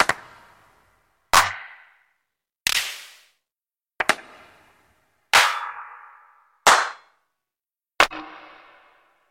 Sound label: Claps; Claps On Fleek